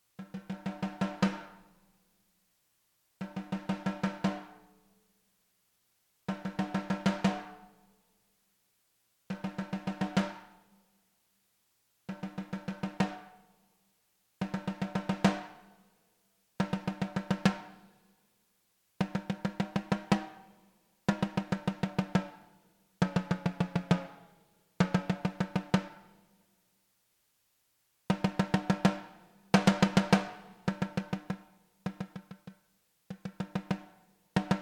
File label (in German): Modifikation Alu SN; ALESIS Strata Prime